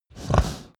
pig grunt breath

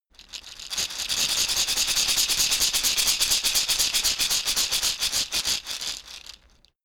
shaker wood rough rattle dynamic

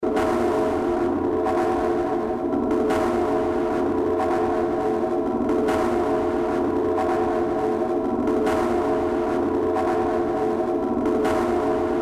loop metallic

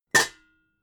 pots hit hard short

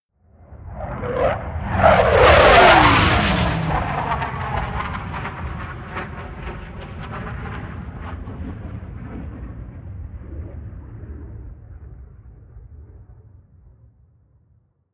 air strike

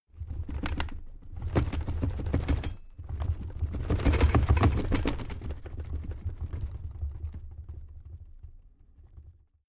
drawer cabinet crammed rattle

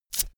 velcro open cap fast

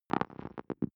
sci-fi electronic damaged movement heavy

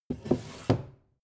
book shelve

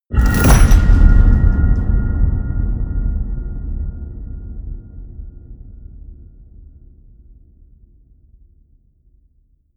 metal crash hollow ring out medium

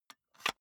cassette case close soft

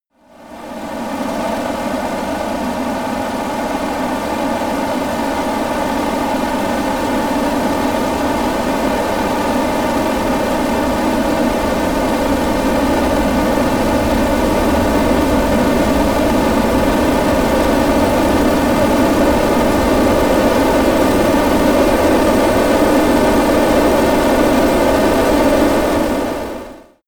military jet eurofighter typhoon turbine rev up

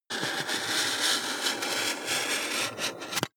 marker mark soft long

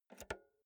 insert jack multi socket

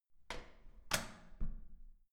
door close soft

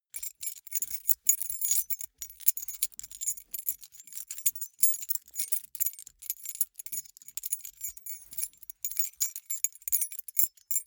key ring small rattle sequence hard

